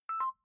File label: proton drop; build-an-atom